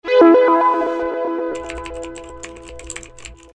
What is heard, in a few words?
jingle